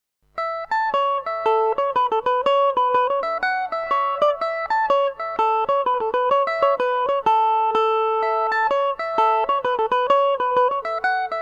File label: gale bridge amaj, mandolin; Revelation Jaguar electric mandolin